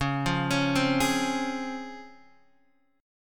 C sharp Major 7th C sharp Guitar DADGAD

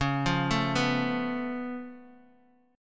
Db Augmented 7th Db down Guitar Open D

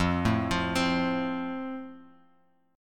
F Major, Guitar Open G

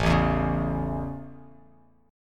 A Major, Bass Standard; strummed

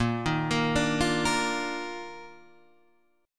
Eb Major 7th Suspended 2nd Bb

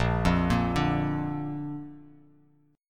Bb Major Bb down Bass Standard, chord; arpeggiated; JGuitar

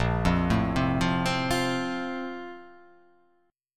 A sharp Major 7th Suspended 2nd

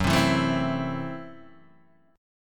F sharp Minor 7th Flat 5th F sharp Guitar DADGAD

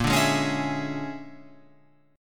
Bb 7th Sharp 9th Flat 5th Bb down Guitar Open F; Bb7#9b5 strummed; JGuitar

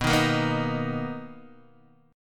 B Major 7th B down Guitar DADGAD

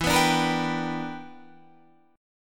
E Minor 7th Sharp 11th E; Em7#11; strummed

